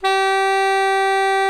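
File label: tenor sax A3; TSX TENR SAX; Giga Samples Collection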